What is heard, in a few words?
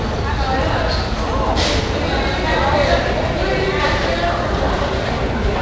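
store ambience; WhiteOut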